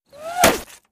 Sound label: Bullet Impacts With Whir In And Impact